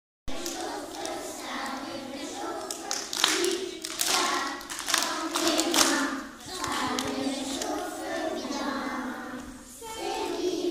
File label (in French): clic clac dans mes mains